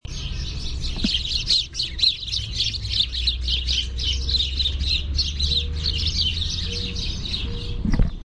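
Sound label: cheep, House Sparrow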